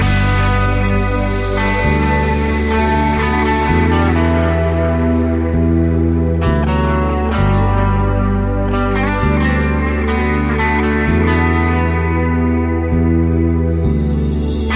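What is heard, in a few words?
looped melodies for guitar, loop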